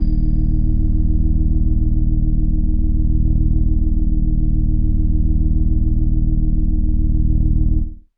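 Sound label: Indie Organ Kawaii D#1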